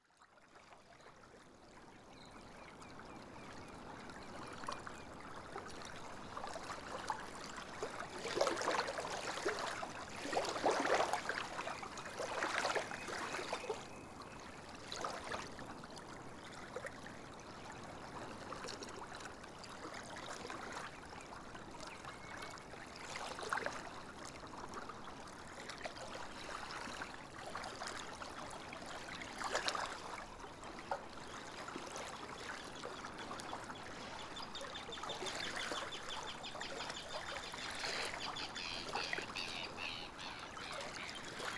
The dock at Holtstangi, In Önundarfjörður (fjord) northwest of Iceland; tekið upp um miðnætti í fjörunni við hliðina á bryggjuni 19. júní 2012; Recorder: Sound devices 744; Mics. Rode NT1a (NOS); Flateyri